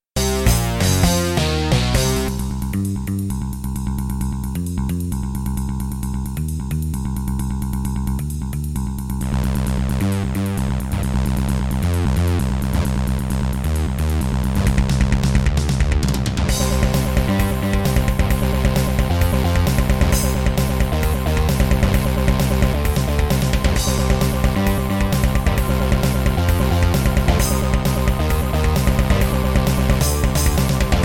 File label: Yamaha MU500 GS MODE; sigil doom episode 5